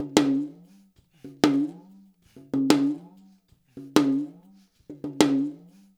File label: LOOP, Percussion, FUNK; InVision Interactive; Percussion Slam